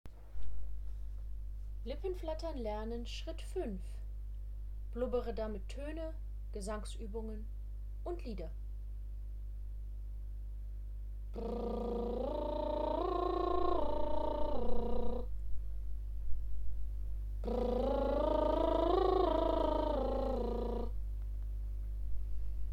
Lippenflattern; Lippenflattern lernen Schritt 1

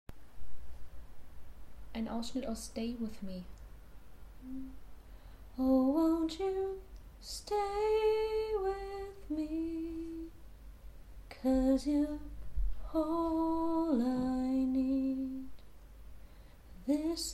Kopfstimme finden Einen traurigen Song singen

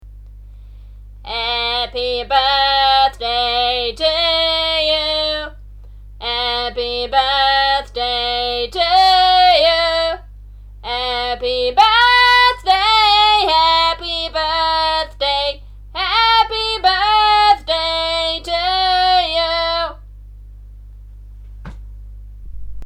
Happy Birthday mit gepresstem Klang; Gesang; Klare Stimme

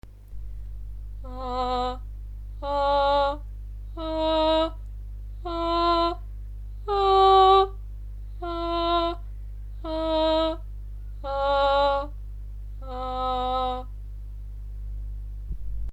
Klare Stimme Weicher Stimmeinsatz

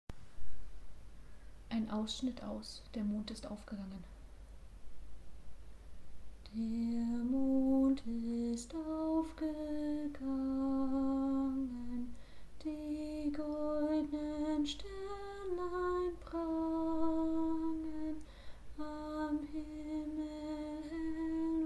Kopfstimme finden Ein Wiegenlied singen

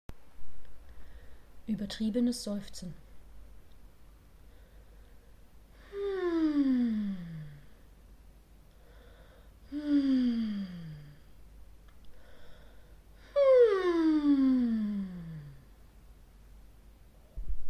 Kopfstimme Uebertriebenes Seufzen; Kopfstimme finden

